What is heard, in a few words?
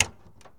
99 Mustang Hood Latch; Automobiles